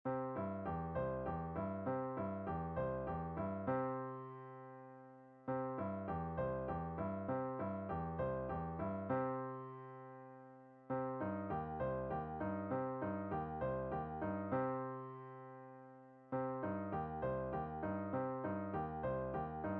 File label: Arpeggios Exercise